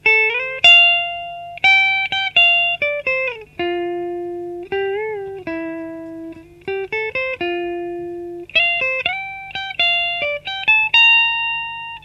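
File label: Les Paul clean bridge, Bridge pickup, Канал clean Fender Frontman в линию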